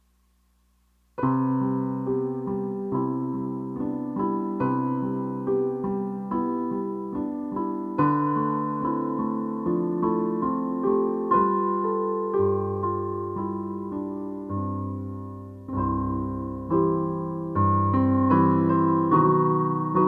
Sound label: Jazz Medley, piano samples